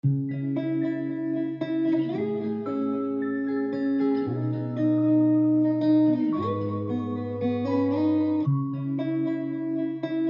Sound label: 114 C minor melancholic guitar